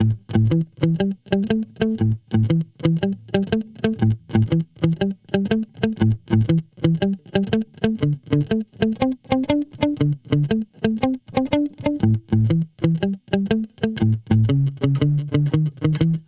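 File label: Blues Guitar; Pro Tools Essential Loops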